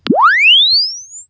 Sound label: press one finger Chirp; Samsung; zhengkun